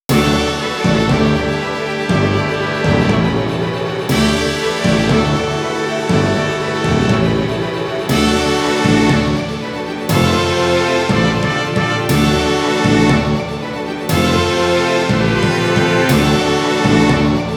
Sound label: Fanfare; Fanfare - GII, GIII